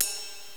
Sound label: ride; hofclient